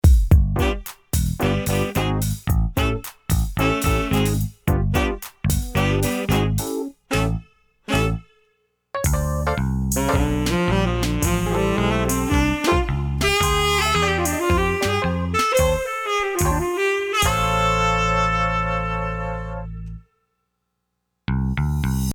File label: Commercial; Seasons 52